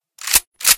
spas12 reload end, weapons

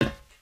metal plate, human step

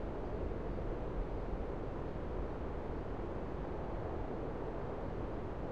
wind background; ambient

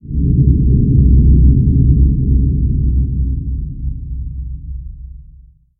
drone, outdoor ambient

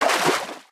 water, human step

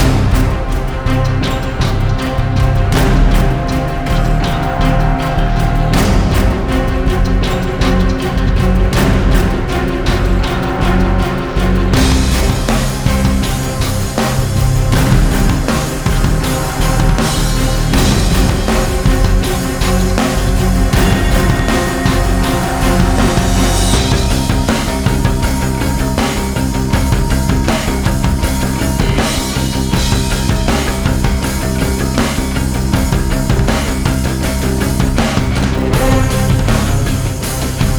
theme, music combat

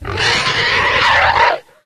flesh panic, monsters

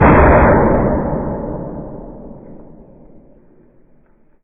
hit

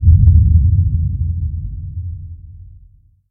drone, outdoor ambient

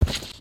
soft, human step

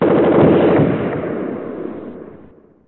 rnd shooting, ambient outdoor

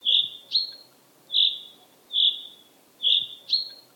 new birds, ambient outdoor

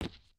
new wood, step

